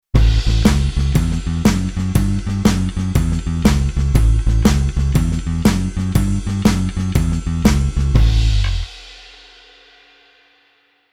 bass blues

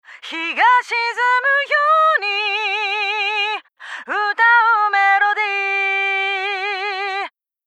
EQ Filter After

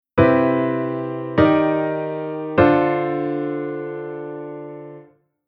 Chord bIIImaj7

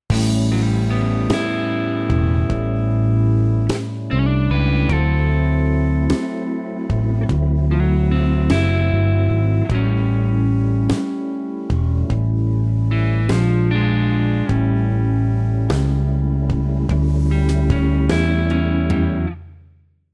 guitar pattern

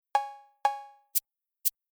Rhythm Electro Perc

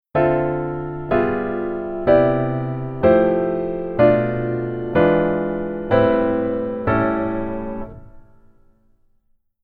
keyboard top note

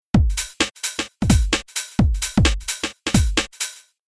Rhythm 2Step

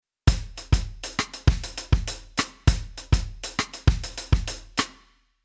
Rhythm Reggae

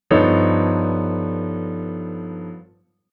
Chord Tension Dominant V7 9 11 b13

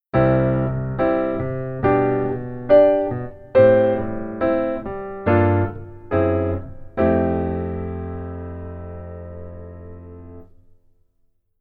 Keyboard

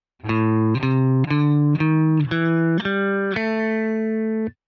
guitar minor bluenote

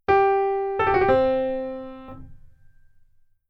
Keyboard Turn

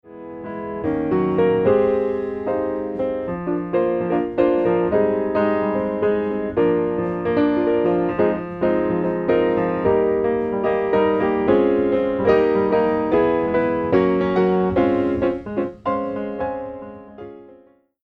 Mix Piano On Comp On

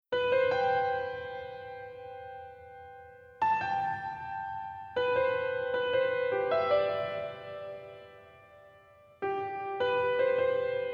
Reverb Delay Piano Reverb Far

